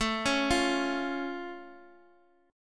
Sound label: A Augmented A down Guitar Half Step Down